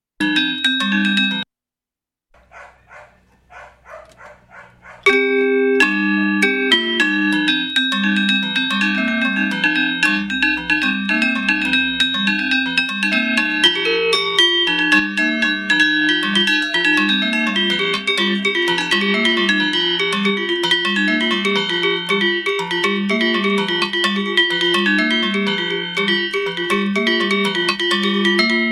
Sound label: kajojor sukawati polos, gamelan